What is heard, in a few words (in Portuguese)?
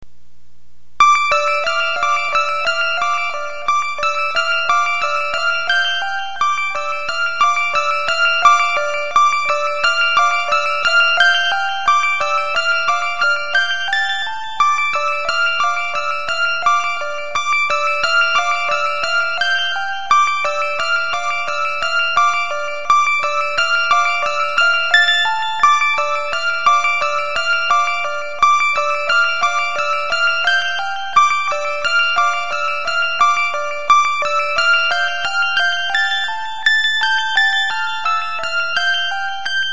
infantil, tecladista